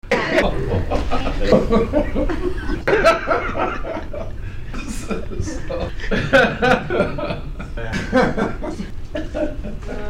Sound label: laughs